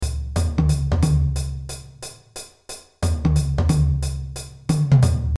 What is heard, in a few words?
drums